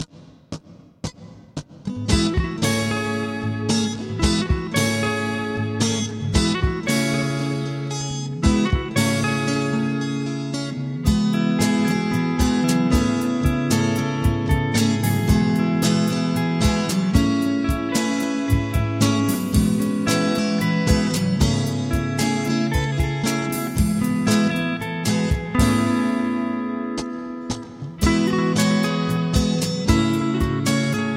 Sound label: Lullaby